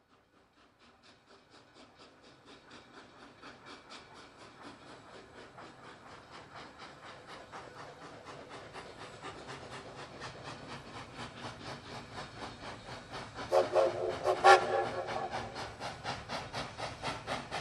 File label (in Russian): zvuki priblizheniya parovoza gudok